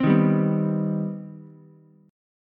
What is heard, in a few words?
C-Minor Major 7th, Ukulele; strummed; JGuitar